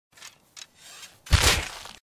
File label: headshot sound effect; rust